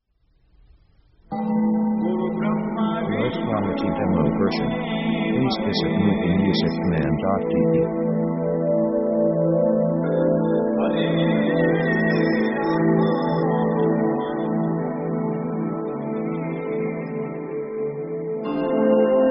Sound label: horizon, contemplative